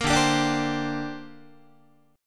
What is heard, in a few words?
D 7th Suspended 4th Sharp 5th down Guitar; D7sus4#5 strummed